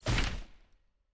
我的发布 合同刷新; nba

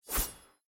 通用小弹窗; nba

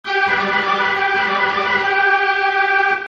主场风琴上行; nba

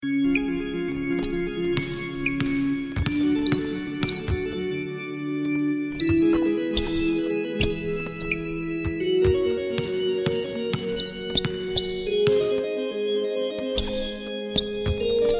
风琴上行; nba

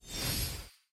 球员属性页签动画; nba; 球员升级

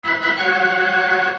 风琴上行; nba

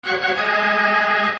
风琴上行; 战中; 战斗音效; nba

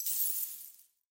购买 钻石; nba

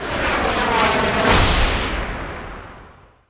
绝杀 无哨声; 战中; nba

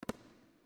传球; 战中; nba

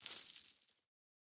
购买 花钱; nba

